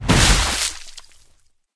victory water exit; Smite; Sol

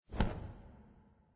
flap; smite; kukulkan

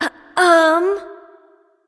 clear throat; smite; serqet

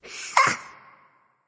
heh heh; smite; ratatoskr

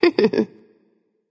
"heh heh"; smite; voicepack; nike

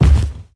foot land; smite; zhongkui

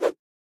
sword swing; smite; npc; olympus

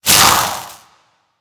projectile; smite; god raijin

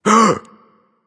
nv breath, vox; smite; voicepack; vulcan

